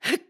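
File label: Jump, voice; Link; BOTW; Breath of the Wild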